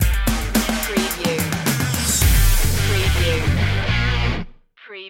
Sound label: beat on fire stinger